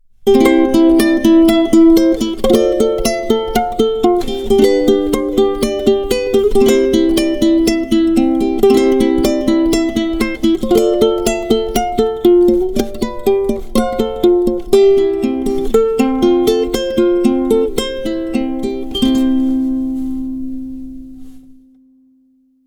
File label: Solo